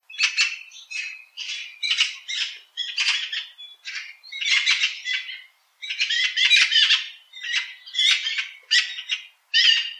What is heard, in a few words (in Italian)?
Gazza